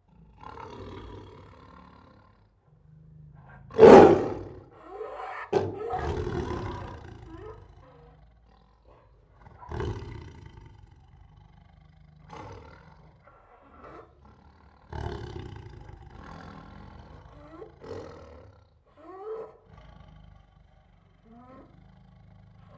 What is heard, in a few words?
Siberian Tigers Make Angry Growls, Roars and Snarls; Sound Ideas